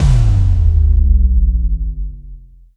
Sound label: Music Lose; Rally Champ